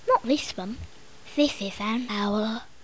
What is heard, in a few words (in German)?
uhu; Little Red Riding Hood; Koch; Storybook